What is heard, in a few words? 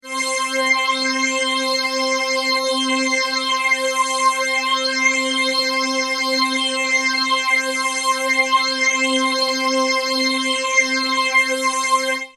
Mutron biphase